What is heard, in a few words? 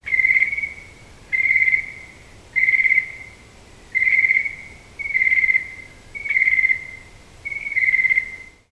Crickets Low Pitch Night